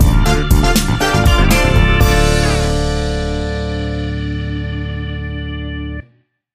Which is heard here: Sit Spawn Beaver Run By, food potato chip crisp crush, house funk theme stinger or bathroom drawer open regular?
house funk theme stinger